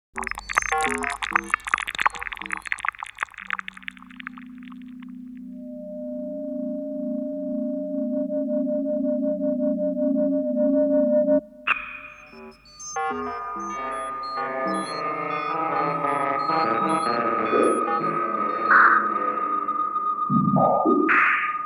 Electronic Music

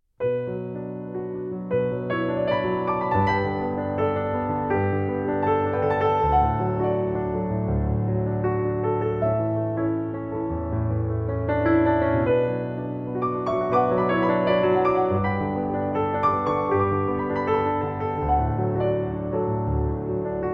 Solo Piano Version

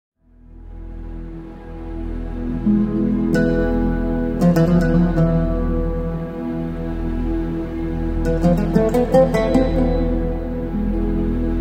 Emotional Ambient Arabian Oud

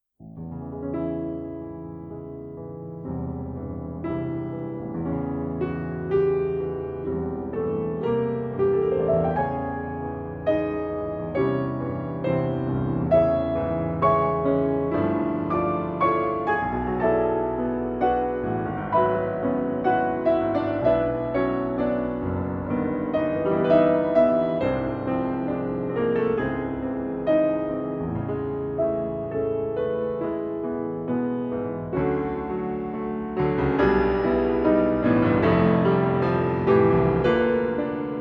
Wedding for Piano

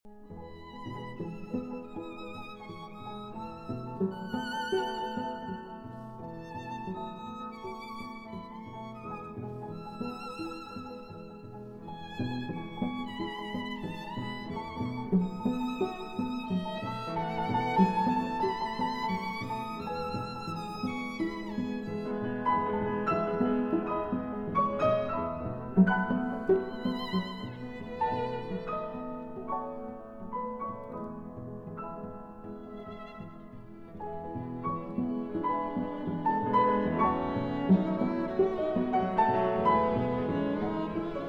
Piano Trio in A Minor; Op. 50, TH.117; Tchaikovsky; Var. XI

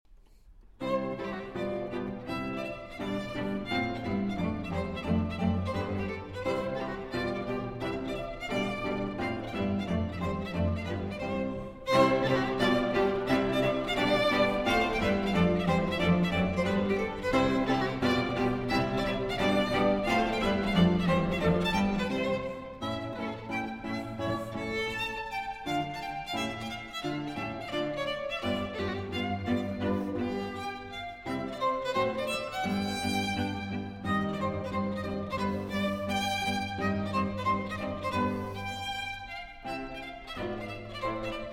Quartet in C Major, Rondo, Allegretto

